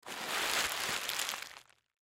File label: Bubble Wrap Handle; Foley Bubble Wrap Handle Movement Plastic; Plastic (Sound Effects:Materials); Blastwave